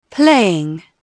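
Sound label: playing, music; Dorling Kindersley My First Incredible Amazing Dictionary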